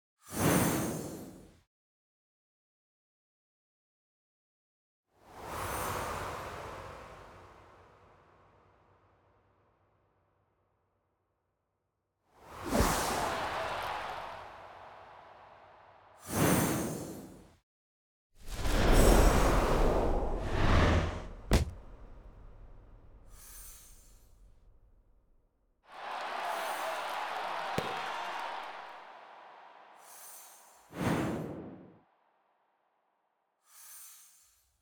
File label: SFX; TV One; 2013 Fall Scatter; Unsung Hollywood